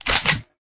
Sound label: rifle reload, weapons